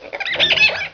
monkey

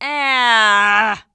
AHH

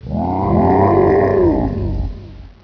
alien, water

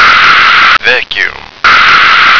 vacuum

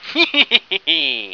laff

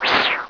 swish

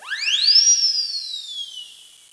WHISTL

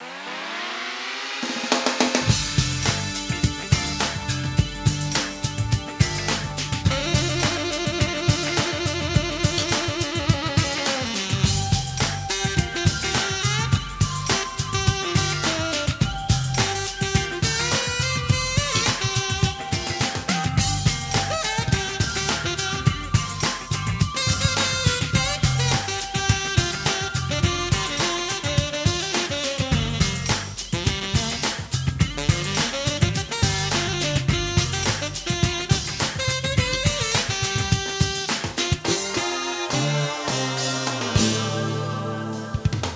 sax

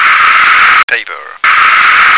paper

